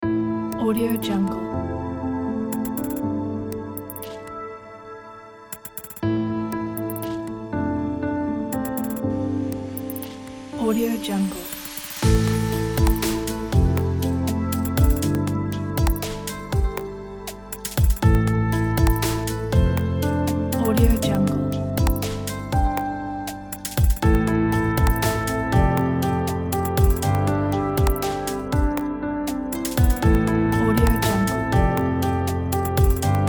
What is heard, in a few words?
soundscape ambient